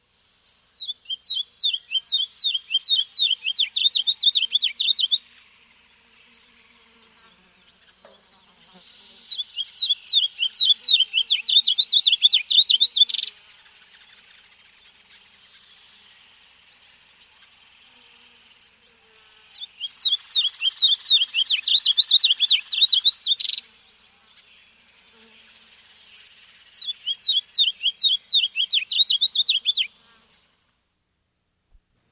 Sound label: Olive-Crowned Yellowthroat; S. America